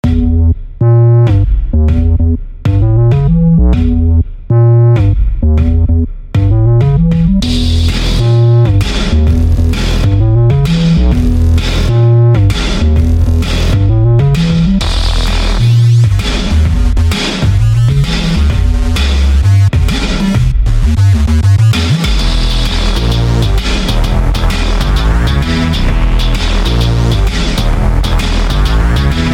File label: bass; kitchen; weeklybeats-2020